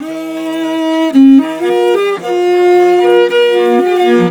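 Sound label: VIOLI LP, MORIN violins, Asian Traditions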